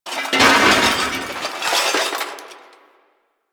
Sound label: Desktop Metal Destroy; ambience; Warframe; Entrati